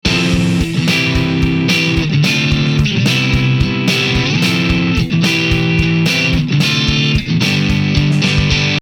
drummer hukuzatsu; guitar

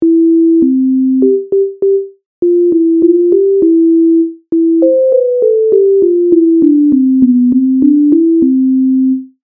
Sine wave

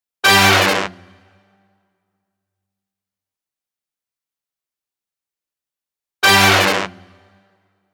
Orchestral Hit

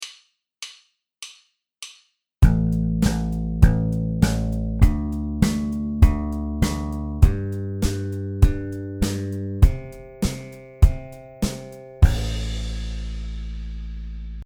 thumb under, bass

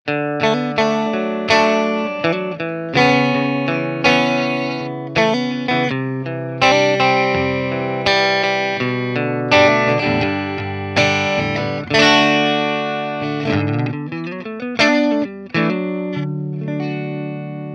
rig wet; guitar

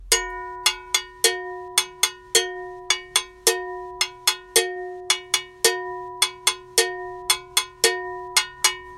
cowbell

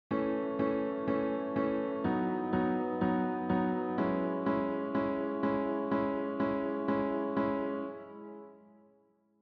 Piano

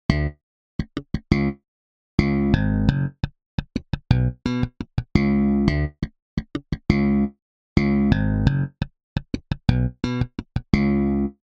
Bass

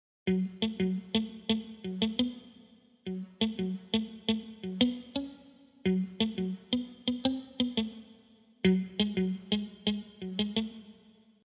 Guitar

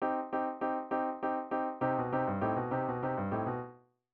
ballade; piano

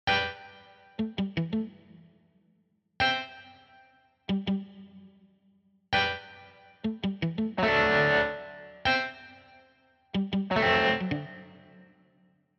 Guitar

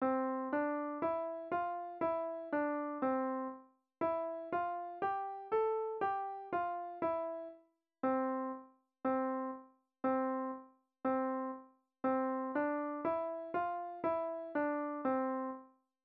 C major, piano

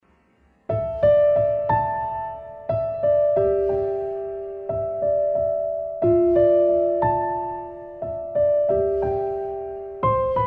steinway noise

